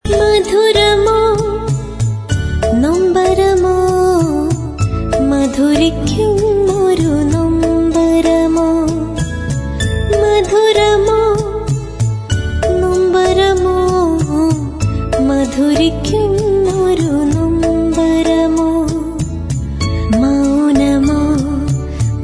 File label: Female